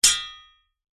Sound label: warfare swords hit single strike